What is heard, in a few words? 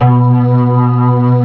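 C3, major minor violin trill; East West; Global Sounds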